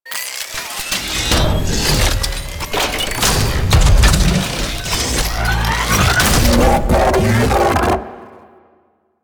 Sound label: Mech Spawn; Warframe; Vehicles; NechroMech